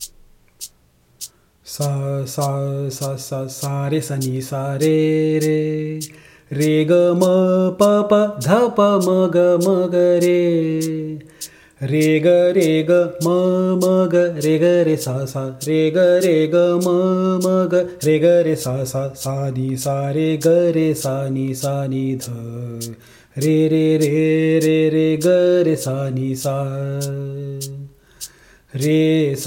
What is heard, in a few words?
Vocal; Bansuri Student Zone